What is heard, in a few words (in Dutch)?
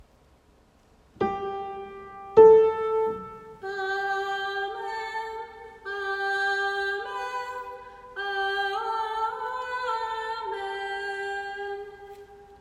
Amen stem 4